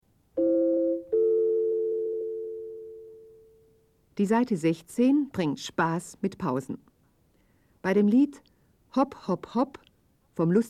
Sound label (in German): Spiel Blockfloete